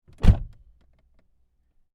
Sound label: car door close internal; spoteffects